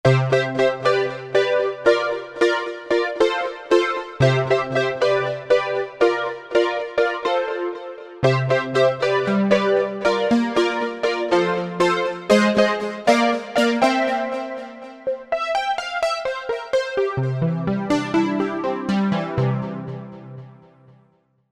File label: Synth Pop Piano